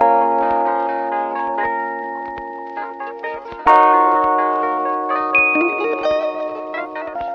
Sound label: keys and guitar, Phonky loops